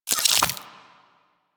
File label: Warfan Unequip; InfWarfan; Warframe; Weapons; Melee